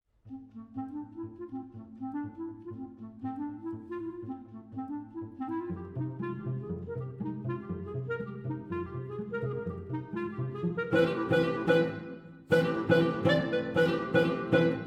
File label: disco